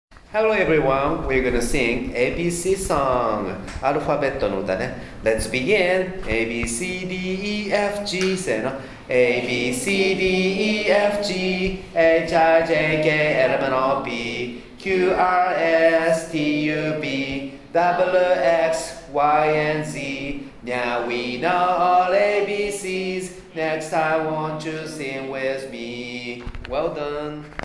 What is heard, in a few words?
ABC song